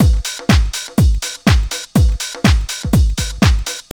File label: house loop